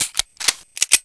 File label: colt 1911 reload; soldat